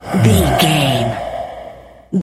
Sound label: voice male breath sigh